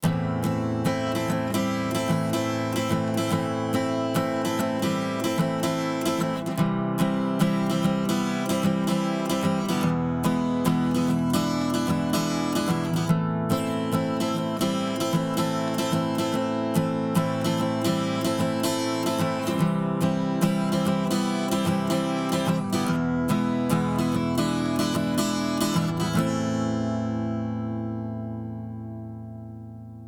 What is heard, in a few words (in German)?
Gitarre Fast; Rockruepel Comp.Two V2